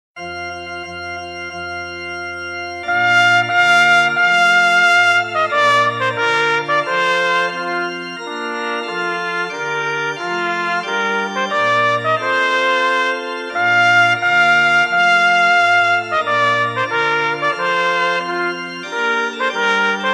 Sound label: trumpet tune, 1 trąbka